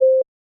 click short; osu!; Luminance - Fluorescence

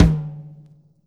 drum hit clap; osu!; Lys Renewed

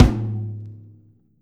drum hit finish; osu!; Lys Renewed

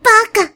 combo break; osu!; Night-Gre3n

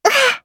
combo burst; osu!; Night-Gre3n

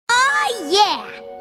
combo burst; osu!; Retronix 2.0

